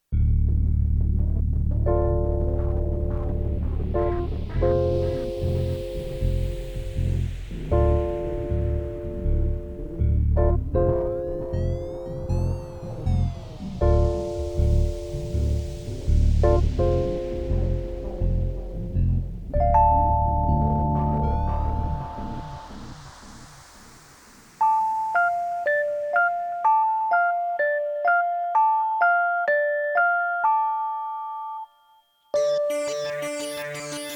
piano prelude; Delusion

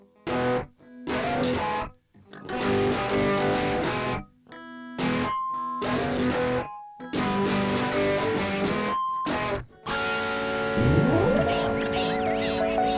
Hard Rock intro

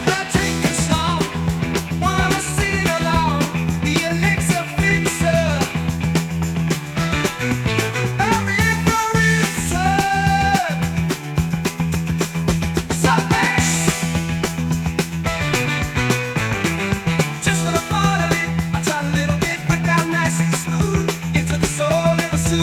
A Drinking Song; MegaEpix Enormous

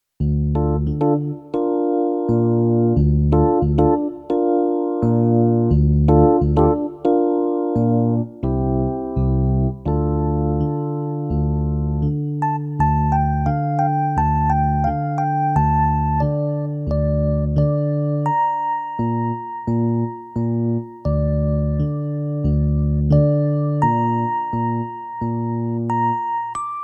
electric paino

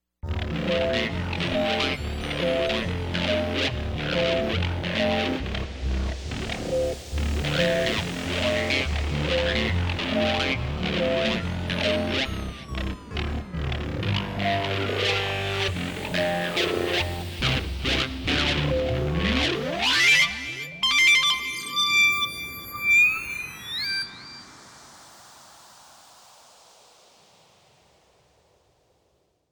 synthesizers

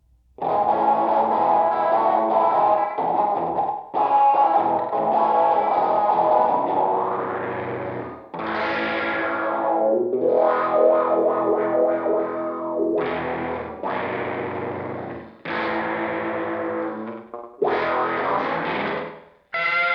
electric

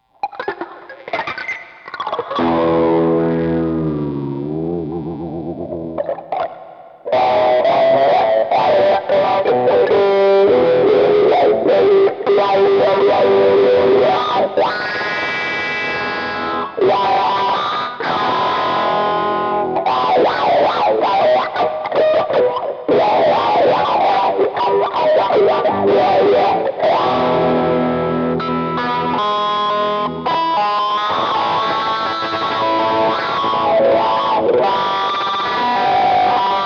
electric

